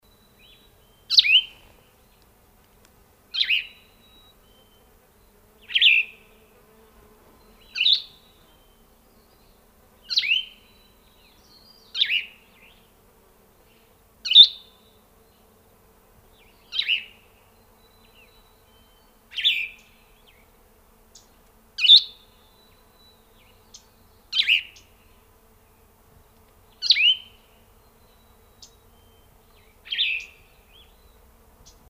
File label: plumbious vireo song, grand canyon